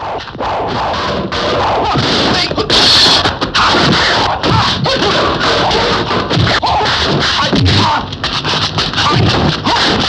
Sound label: kungfu showdown, Cartoon Film Effects